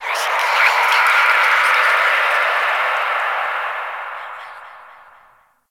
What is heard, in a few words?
Whispers Small, Ambience; Warframe; Orokin Moon